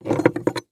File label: Industry Tool Box Remove Crowbar